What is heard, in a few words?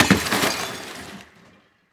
Explosion Debris; Weapons